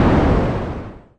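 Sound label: explosion; starcrus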